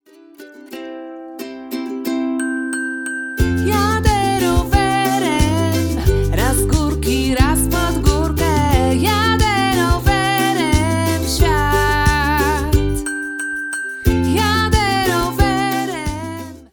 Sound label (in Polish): wersja wokalna; mini pakiet